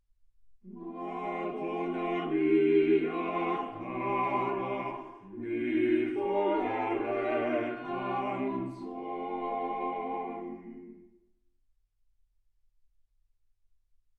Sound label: quartet